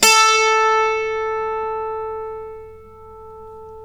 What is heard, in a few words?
a3, Mandolin; Guitar; Terkelsen